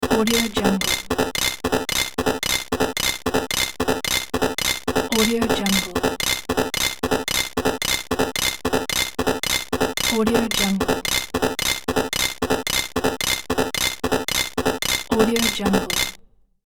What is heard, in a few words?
games retro machine